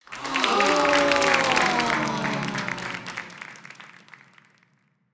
Victory; portal quest android; Blue Mage

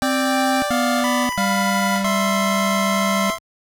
Jingle, GBC; warau inu no bouken silly go lucky 2001

